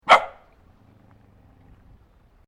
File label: Animal Dog Mongrel Medium Bark Growl; Various domestic dogs; Dogs (Sound Effects:Animals)